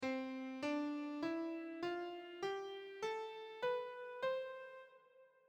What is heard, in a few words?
Major Scale